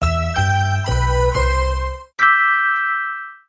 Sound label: 4 note Jingle